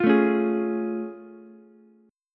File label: A Augmented Major 7th A, Ukulele; strummed